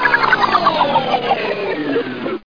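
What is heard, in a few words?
backward rocket; How Many Bugs in a Box; juicebar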